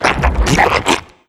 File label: puke start; pestilence